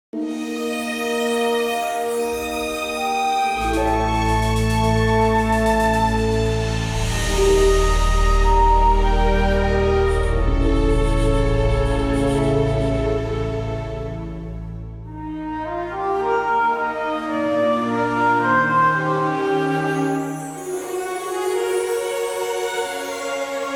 Clear!!; Sword Art Online Integral Factor

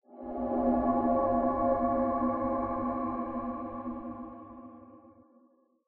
Diving Music Texture; The Sinking City

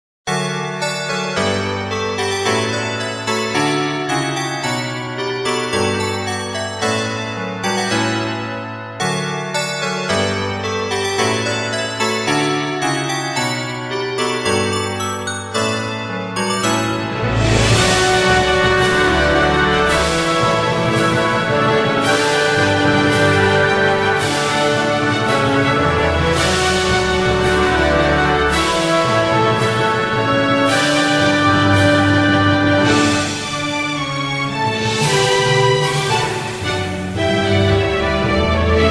Five Bell Melody; Harvest Moon: Animal Parade

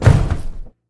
Mech Stomp; Lethal Company; ambience music; Windows

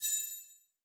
Spawnin Shimmer; Boomerang Fu

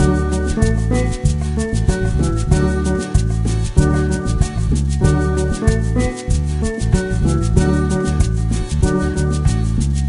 Cruise Music; Diner Dash: Flo on the Go; NDS

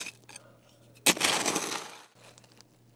Shovel Ground; Lucius III; BanterMason

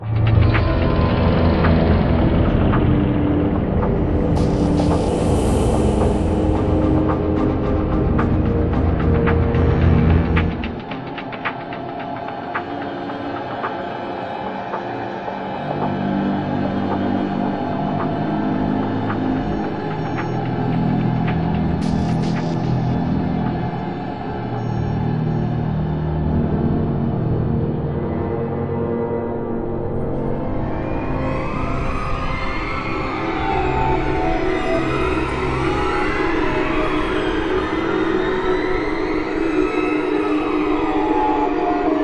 Tension ambient; Judge Dredd: Dredd vs. Death

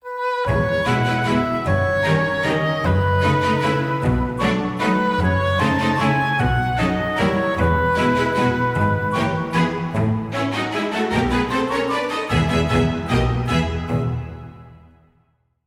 Waltz; fire emblem three houses gamerip

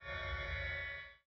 Zoom Chunk; Insanely Twisted Shadow Planet

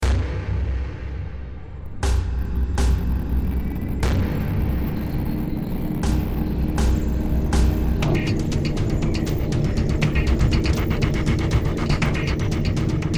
Suspence; Midnight Train

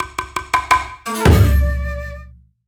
Jingle Finish Japan; Clubhouse Games: 51 Worldwide Classics; Salon

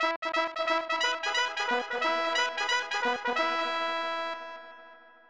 unknown jingle; Shining Force 3; Saturn rip

